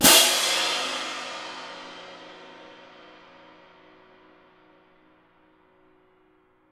CYM F, Percussion; Miroslav Vitous - Percussion & Harp

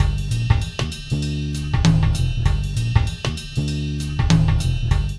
LOOP

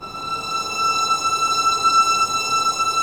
STR VLN SD, Vlns Bow FX, Sord; Roland - String Master Series

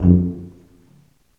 TUBA, Tuba stacc; Roland L-CDX-03 Disk 2

